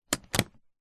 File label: flightcase metal latch single lock